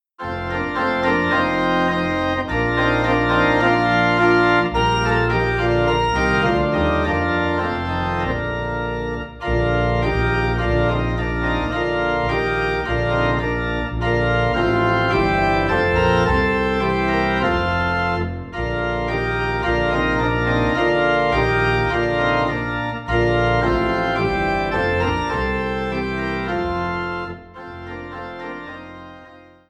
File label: Organ Low in B Flat